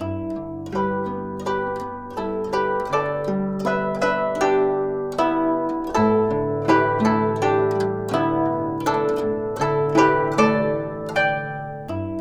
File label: GUCHIN 90 D; INIS - Opium